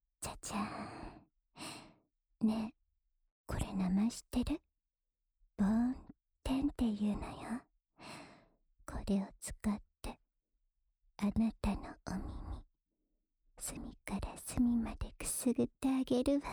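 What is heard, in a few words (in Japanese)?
安眠用梵天耳かきループ（右耳）, ASMR; 特典 恋愛サポーター・青春べろちゅー委員会♪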